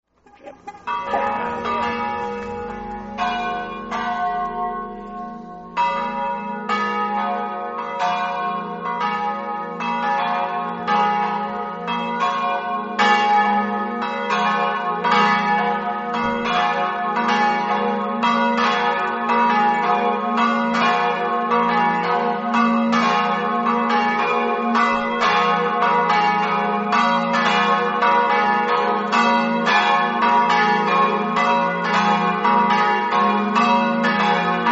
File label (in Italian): campane